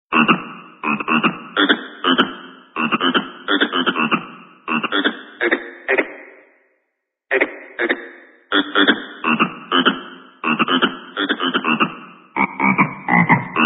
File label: frog language; animals